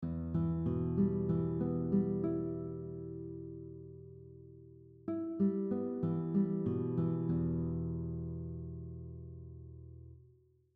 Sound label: Fingerpicking exercise 6 string skipping using all fingers, guitar; 5 to 3, 4 to 2, and 3 to 1. Then you play the same skipping pattern from high to low